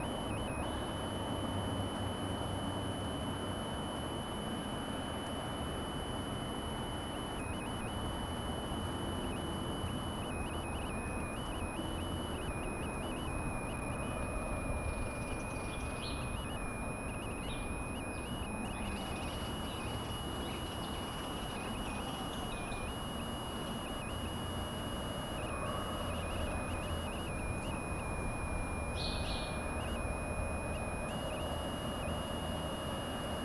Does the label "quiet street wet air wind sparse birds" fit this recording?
Yes